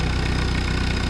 JEEP; Rabbit-Wars

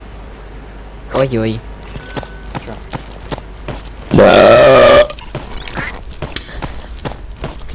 Burp

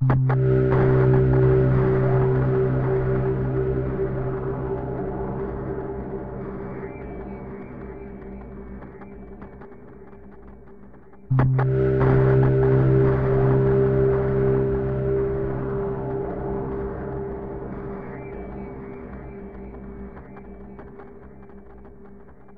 ambience; OneShot Fading Memory

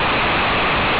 noise loop; Rabbit-Wars

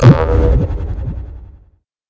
rope

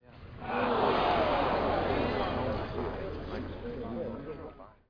crowd bad

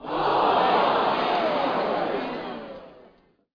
crowd sigh

